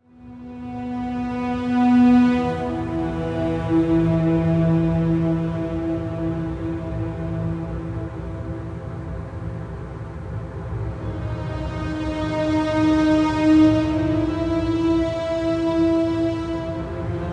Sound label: strings; Inception The App